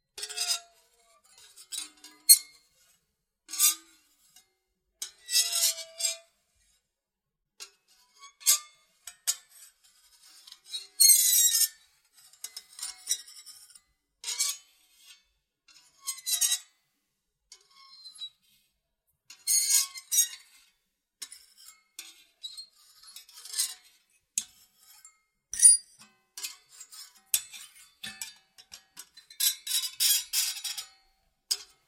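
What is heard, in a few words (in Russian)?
соскабливание ножовки по радиатору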